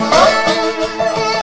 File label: dobro danger